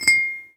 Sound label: Glass Ding